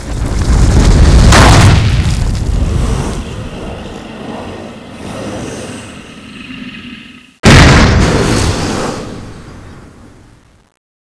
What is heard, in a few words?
appear, scorpion